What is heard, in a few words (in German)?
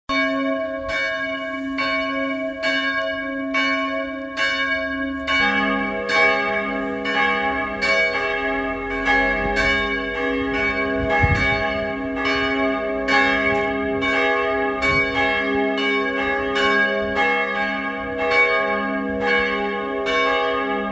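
Tonaufnahme gis1 cis2, mehrstimmige Geläute; 1961 und 1958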